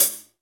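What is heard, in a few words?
hi-hat, hi-hat real; Sound & Vision - Gigapack I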